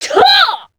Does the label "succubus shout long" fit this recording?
Yes